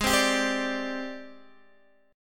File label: Ab Major 7th Flat 5th Ab, down, Guitar, DADGAD